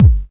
raw bass drum sound a key, bass drum sound tuned to the A note; kick drum samples